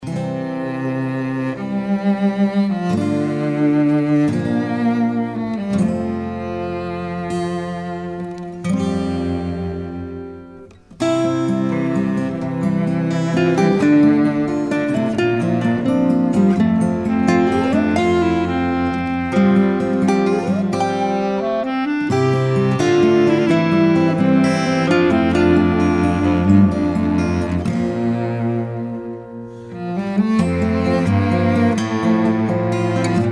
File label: Acoustic and Nylon String